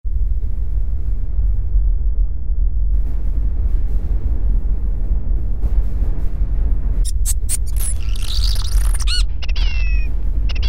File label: four birds